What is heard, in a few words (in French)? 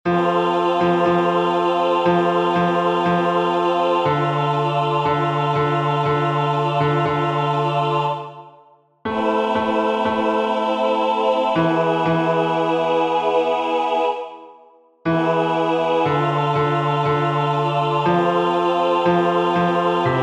Postea Basse; 7Paroles